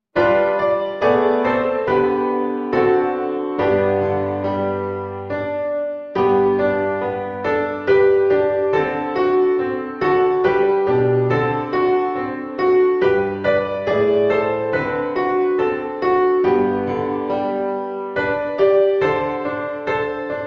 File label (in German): Wiegenlied